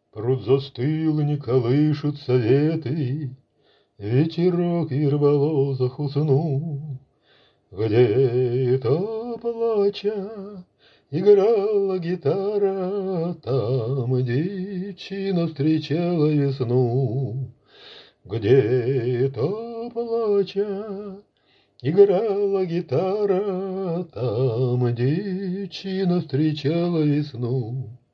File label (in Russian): plakuchaya gitara